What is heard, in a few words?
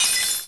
egg hatch; pokerogue